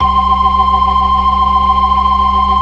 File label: FAST VIB, ORGAN, TREMOLO VIB